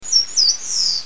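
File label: eastern crowned warbler; sendaimusikui; toritori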